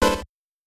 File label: click error; 主播女孩重度依赖